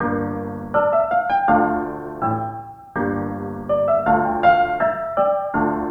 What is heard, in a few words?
Piano Wobble Tape